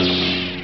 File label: light saber on; starwars